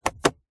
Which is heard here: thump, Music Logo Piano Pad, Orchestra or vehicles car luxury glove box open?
vehicles car luxury glove box open